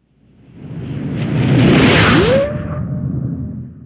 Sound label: Jet Coming Out Warp, spaceship fx